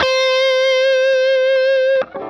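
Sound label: fuzz guitar vibrato; MAYFUZZ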